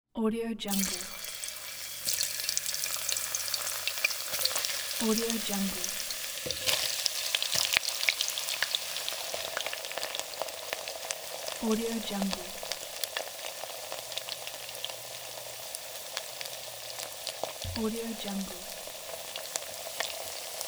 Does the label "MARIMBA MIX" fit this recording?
No